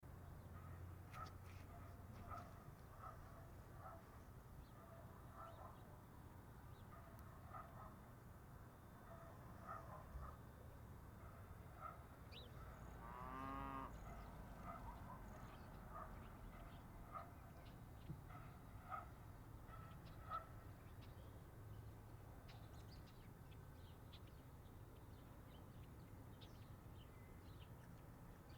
outdoor sounds farm traffic

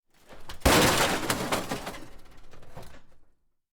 rubble crash

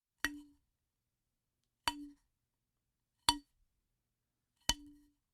metal baseball bat taps

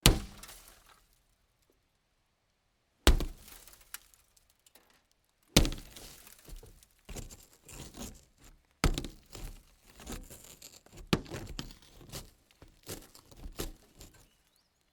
smashing car windshield series

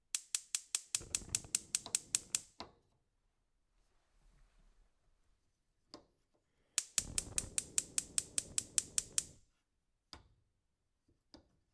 gas stove lighter

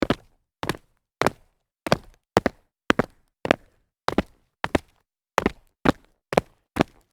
sneaker medium jump concrete

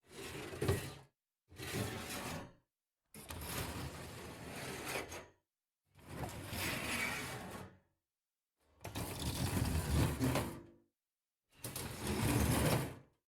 metal sheets sliding

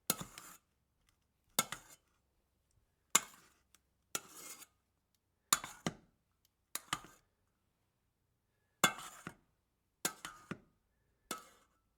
metal spoon scrapes pot

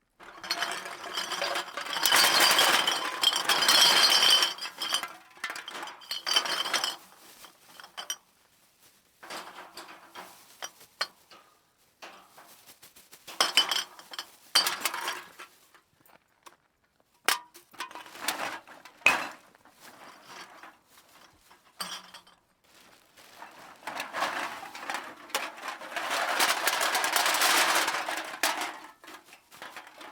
dumping bag of cans series

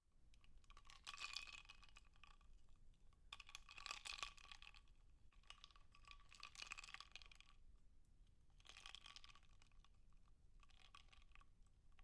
ice in glass of water

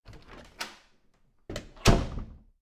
wood door open close

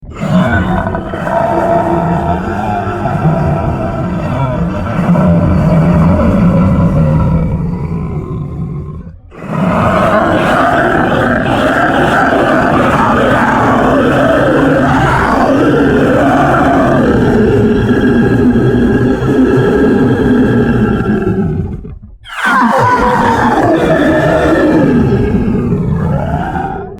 monster alien growl pained

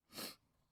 sniffle

